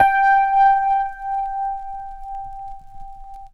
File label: DX100 New Electro Vinyl Synths G4; vinyl keys